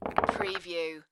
Billiard, Pool, Snooker - Put several balls on table